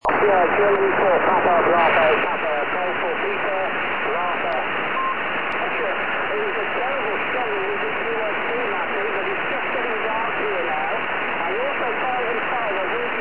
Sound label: ssb 2m, tropo